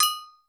agogo; PERCUSSION; Crypton - DrumBase5000; PERCUSSION#1

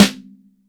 MIX SN, DRY STANDARD SNARE

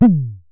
Synare Filter; Star Instruments Synare 3

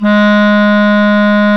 Clarinets

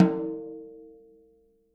SN OFF, snares

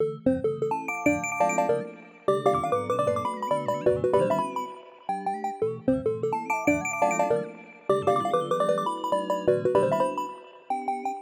fast dots, Loops; Polyend; Loops n trouble

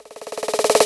Fills, Loops 140 BPM

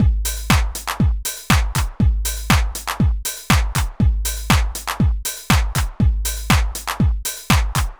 120 trance beat, Drum & Perc Loops

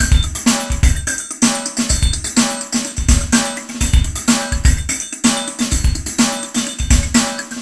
PHASE PHOL 116, LOOPS

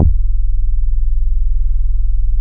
SEQ, DISCO, Bass Analog & Synth; USB Soundscan vol.12; AKAI

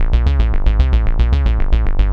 Synth Bass

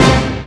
hit four; E-MU Formula 4000 Series Vol. 2; Dance Hits

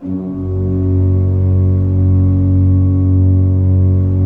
16-8 F1, Cathedral Organ; Propeller Island

